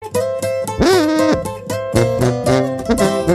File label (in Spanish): Sonido Tuba